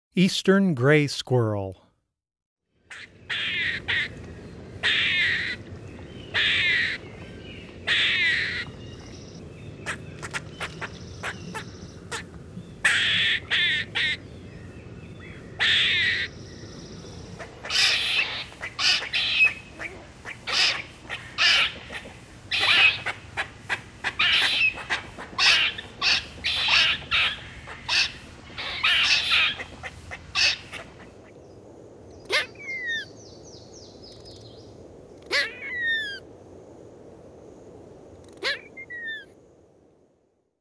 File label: Grey Squirrel, Wildlife Sounds